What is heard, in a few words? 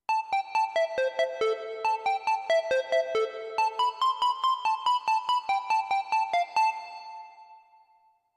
Square Lead